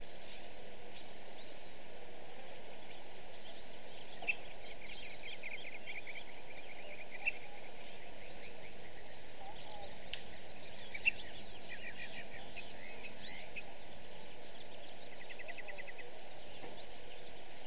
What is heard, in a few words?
Bird Atmospheres Mea, meadow